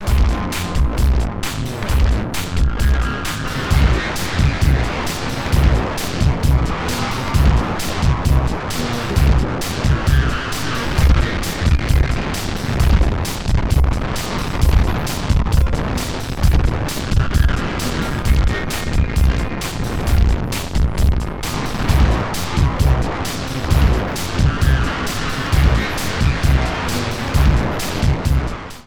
Erica Fusion Plasma Drive Track Dry Wet 50 activating Octave Triggers